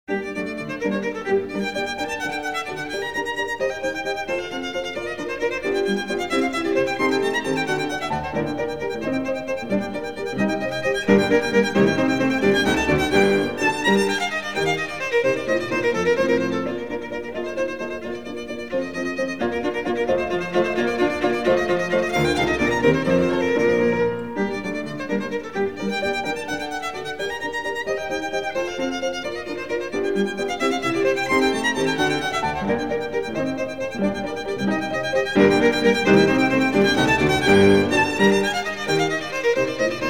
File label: Sonata in G Minor, Vivace, violin; Suzuki Violin Method; H. Eccles